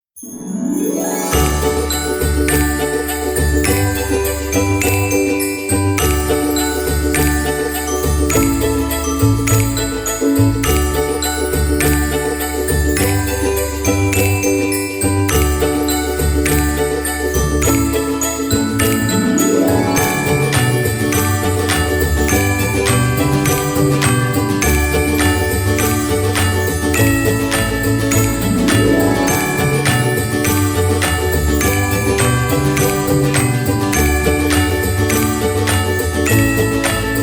Musica De Fondo Para Navidad

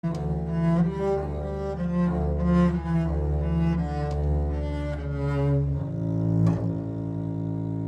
El contrabajo

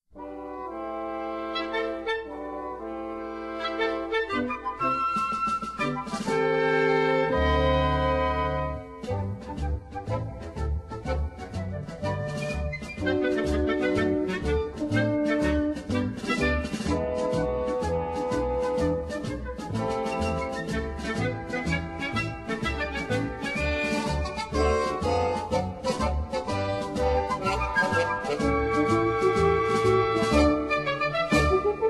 Música Incidental Soundtrack Comedy Signature; Chespirito

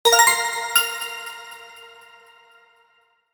Sonido Correcto

